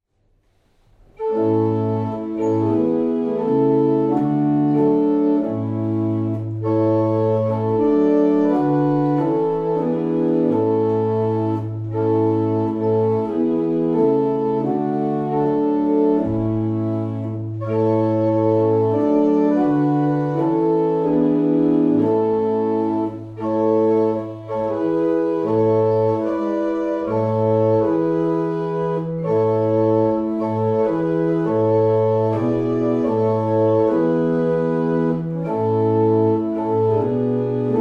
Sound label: Spanish Hymn, barrel organs; Steeple